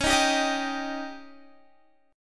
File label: Db Suspended 2nd Flat 5th Db down Guitar; strummed; 4F,4A,5C,5G,5C,6E; Dbsus2b5